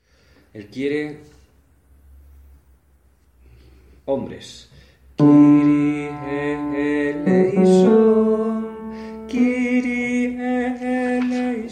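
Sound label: tenor bass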